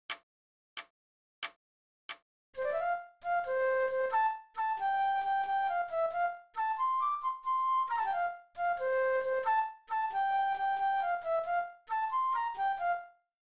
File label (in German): Locken Floete